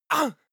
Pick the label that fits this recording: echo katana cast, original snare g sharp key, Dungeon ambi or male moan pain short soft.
male moan pain short soft